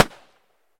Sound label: gun tikka t3 battue 308 Swpph Shot Weak